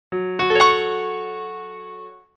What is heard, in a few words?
f# minor, notifications